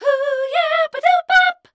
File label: MEL C2, Voice Spectral, MELODIC; Best Service; Voice Spectral II